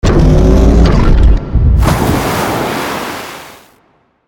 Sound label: waterstep; termination; goliath